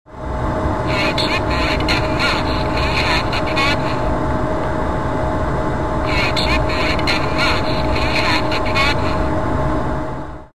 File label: keyboard error; aopen ax4pe max